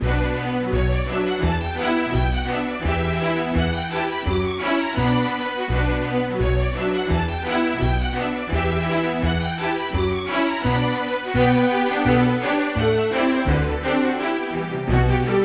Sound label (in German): Streicher; Haschemann